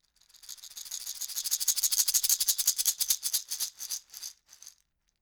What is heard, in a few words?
shaker wood rattle dynamic